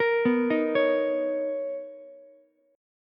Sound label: B Major 9th B Ukulele C6; BM9; arpeggiated; 15,11,11,16